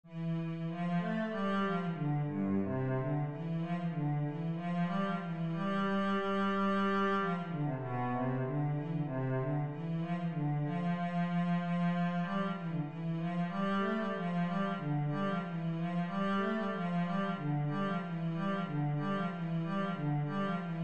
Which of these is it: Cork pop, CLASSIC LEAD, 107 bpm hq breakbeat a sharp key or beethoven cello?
beethoven cello